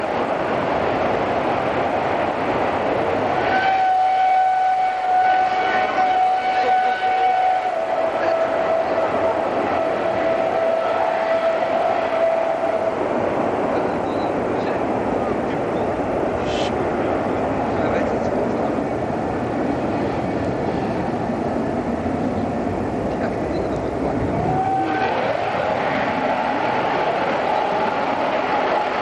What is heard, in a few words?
F-104 Howling